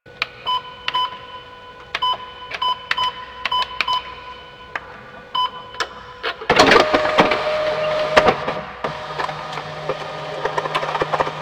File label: Office Fax Machine Misc; Warframe; Ambience; Nineteen Ninety Nine